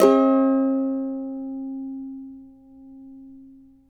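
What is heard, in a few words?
D minor, Guitars; Spectrasonics; Hans Zimmer Guitars VOL-2; CAVA CHORDS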